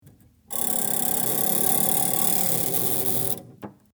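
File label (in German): Kühlschrank Eisfach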